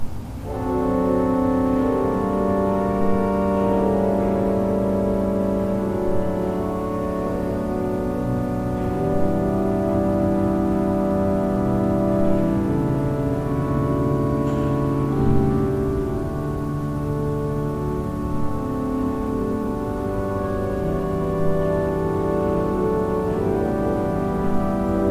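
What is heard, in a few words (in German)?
Seifert Koeln, pfeifenorgeln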